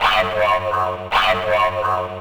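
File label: Guitar Nastyscreech, Drum N Bass; Sonic Foundry (Sony Creative Software); Knutrix Drum & Bass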